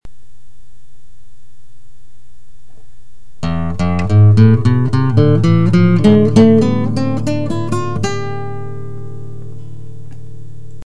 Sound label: spanish scale, classical guitar